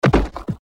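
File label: body falls to ground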